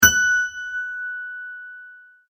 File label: multimedia tone correct